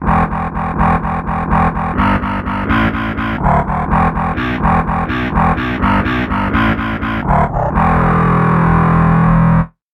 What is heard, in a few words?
overdrive guitar bass